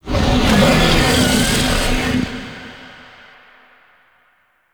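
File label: Horse Glide Start Screech; Vehicles; Vocals; Warframe; Duviri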